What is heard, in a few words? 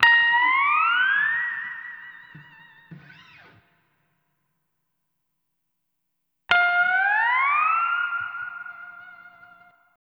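Steel Guitar A#, Lounge; Zero-G Kocktail Kollection; Construction Kitz (Lounge); Volcano at Sunrise 074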